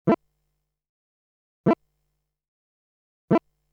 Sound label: Cancellation, string quartet